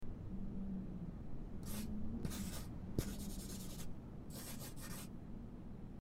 draw; Life is Strange 2; gamesoundopedia

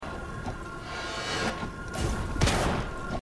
imp shot; risk of rain 2

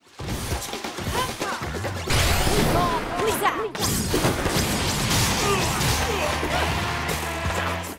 arms special

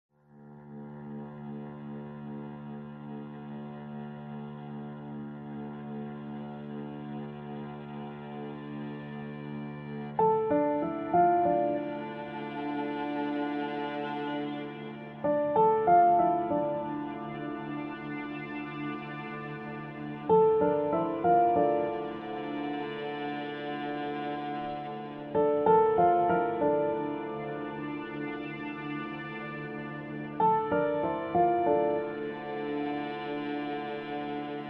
apartment buildings soundtrack sample; Divinity Original Sin II

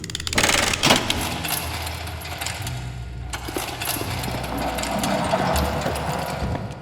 level pull chain mechanism; We Were Here Forever

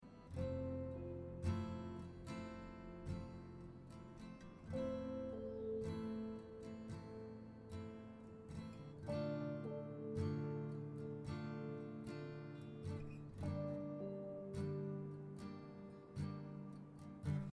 backpack musik; Life is Strange 2; gamesoundopedia

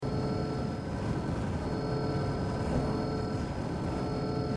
sprint; risk of rain 2

